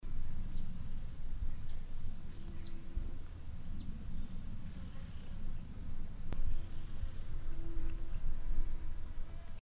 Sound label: Festive coquette